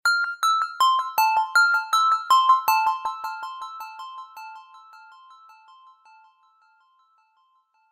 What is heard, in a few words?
High Tone; Coolpad; ESurfing-No1-2021